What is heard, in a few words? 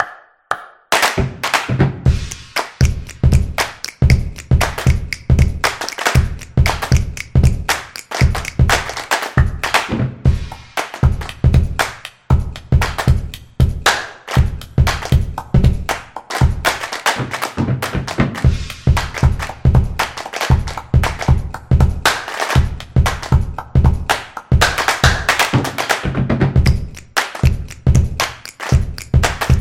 Claps Dance; percussive nation vol 1